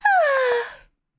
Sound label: yawning; birdo; SM64 CO-OP